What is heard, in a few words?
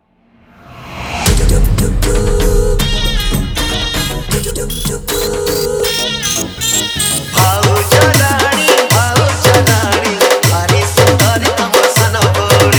SAMBALPURI RYTHEM